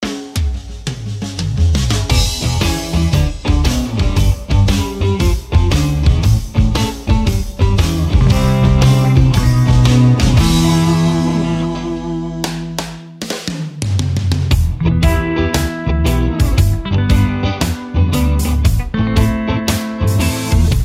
cool blues

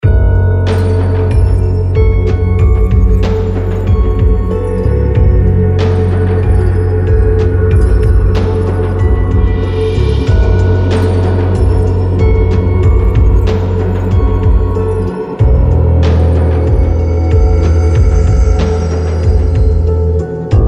QUIET TENSION

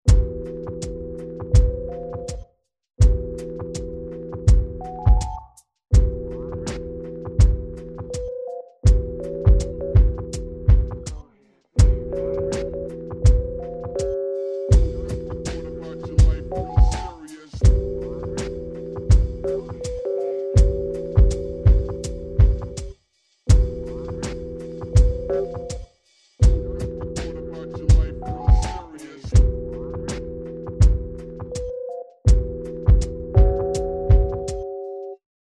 rhodies

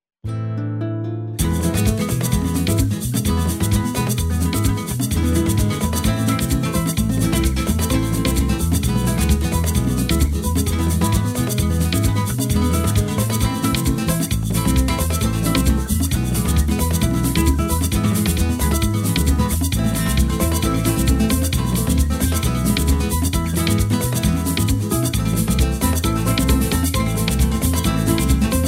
AFRICAN GUITAR RACE

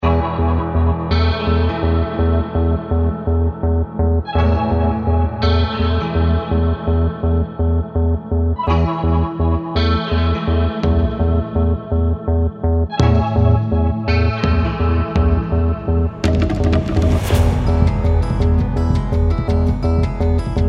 FAST AND HIGH

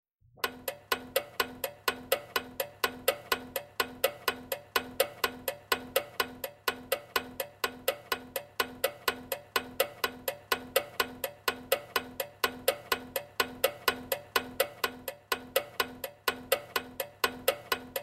CLOCK

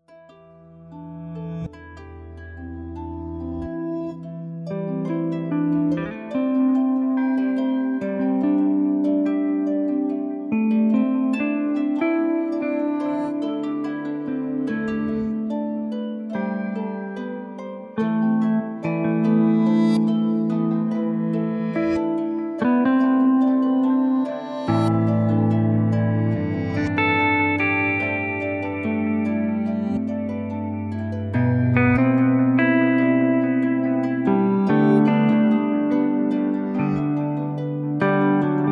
BELLS AND STRINGS

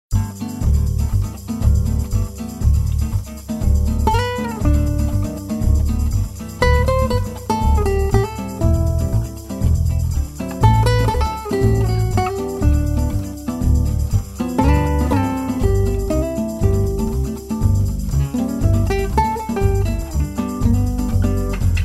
SAMBA

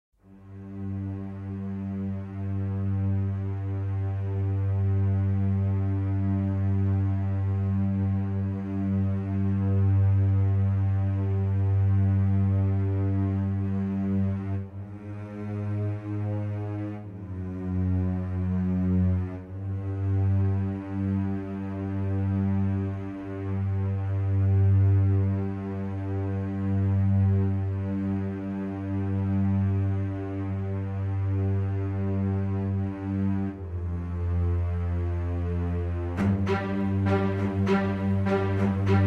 STRINGS

